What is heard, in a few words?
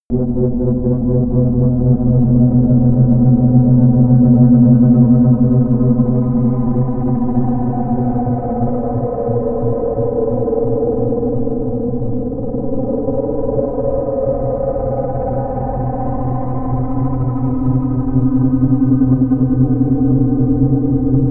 drone scorch low